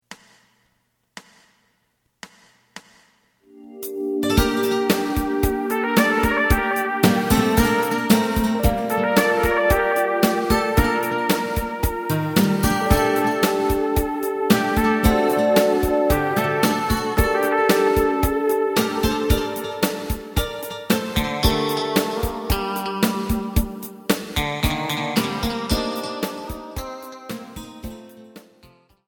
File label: bass